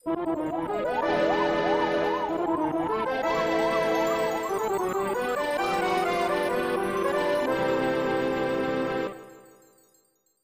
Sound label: Accordion